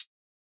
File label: closed hihat, drum one shots; Cymbals; Hihats - Closed; Cymatics; Cobra Hip Hop Sample Pack